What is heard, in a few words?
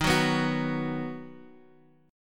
Eb Minor Sharp 5th Eb down; Ebm#5 strummed; JGuitar